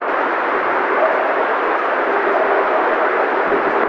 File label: Night Whoops